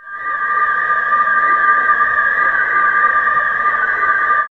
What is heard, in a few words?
SYN FX; X-Static Goldmine 1